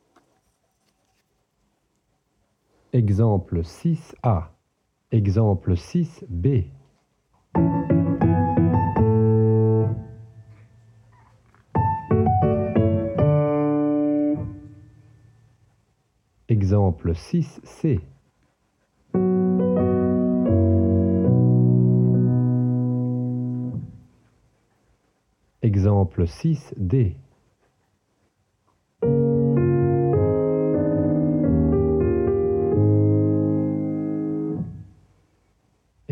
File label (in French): harmonie